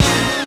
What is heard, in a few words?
stab; Zero G; Man Machine